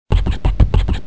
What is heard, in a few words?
button